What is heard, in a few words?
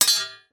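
warfare sword hit another fight; zapsplat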